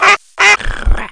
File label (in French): couac